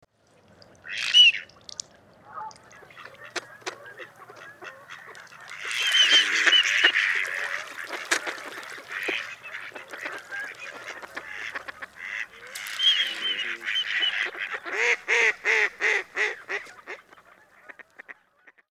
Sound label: Mallard Male Grunt Whistle; Birds; Smithsonian Field Guide Birds America